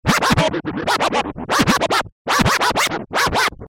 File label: Scratch